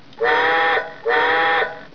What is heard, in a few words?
train